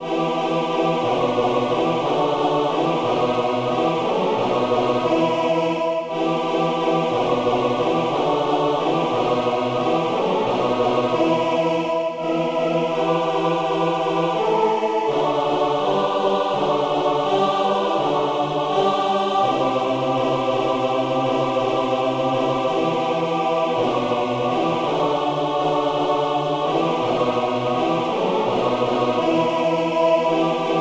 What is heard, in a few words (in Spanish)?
Dindirindin Spanish Anonimus